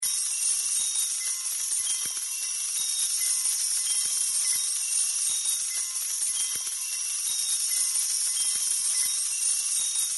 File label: bigwin loop; gonzosquest